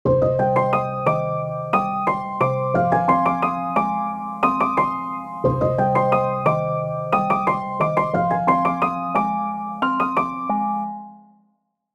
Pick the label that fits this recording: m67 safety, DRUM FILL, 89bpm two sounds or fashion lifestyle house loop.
89bpm two sounds